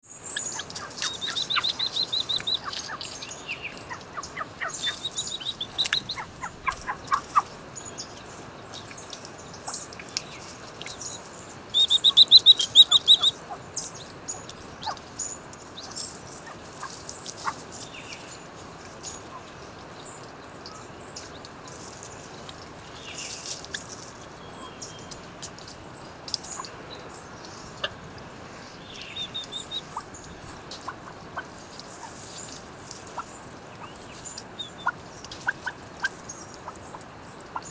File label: Himalayan monal; پرنده کاکلپری هیمالیا